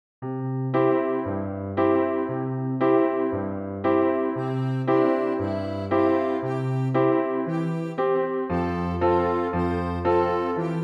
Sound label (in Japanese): kenban karaoke; minyou; Classic; kogitsune